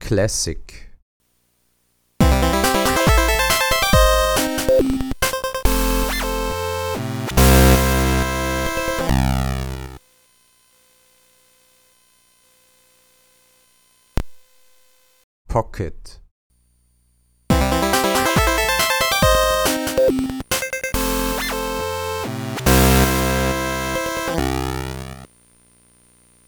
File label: gameboy comparison nanoloop; normalized